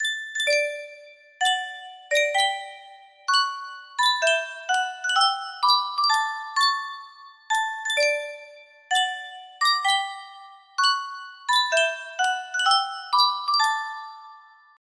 yunsheng custom tune music box

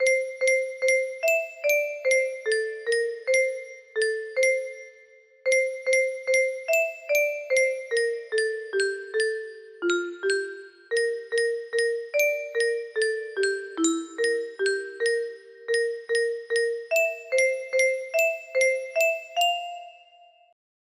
music box, music box melody

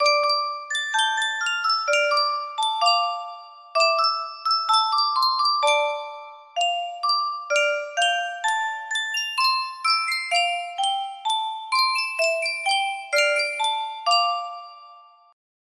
yunsheng music box, music box melody

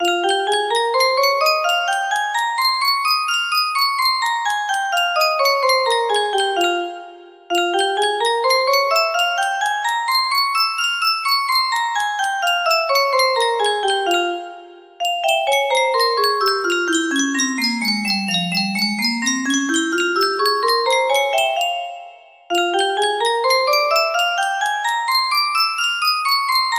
f minor, melody

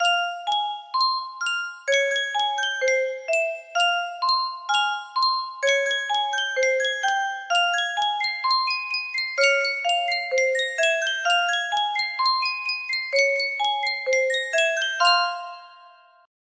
Yunsheng music box, music box melody; alan hovhaness symphony no 9

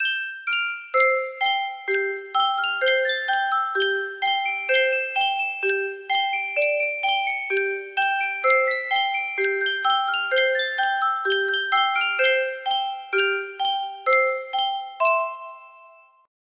sankyo music box, music box melody

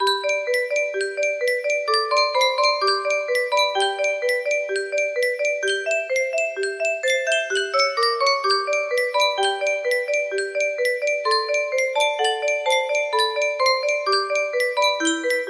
piano sonata in c major, music box melody; mozart piano sonata no 16 in c major k 545 2nd mov

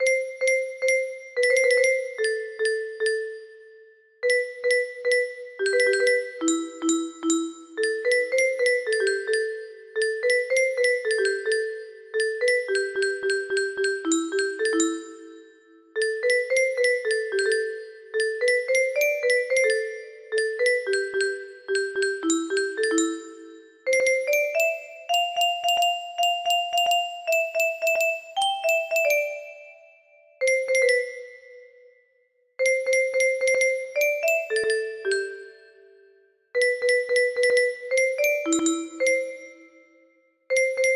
single tone faster faster, music box melody

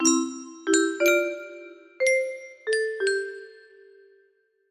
music box melody

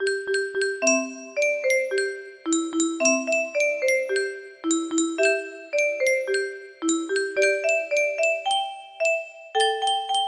music box melody

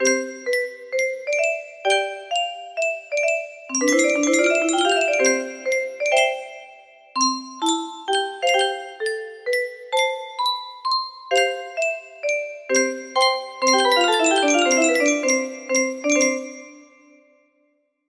short song, music box melody

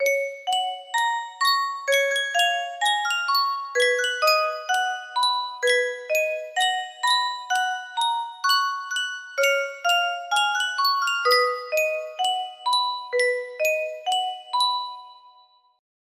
Yunsheng music box melody

